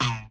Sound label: vintage cartoon boink; Cartoon Sound Effects Kit